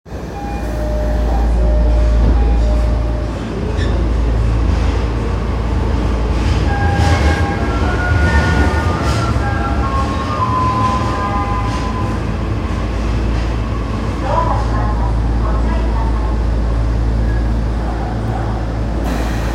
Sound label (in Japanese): 丸ノ内線新高円寺東, train sounds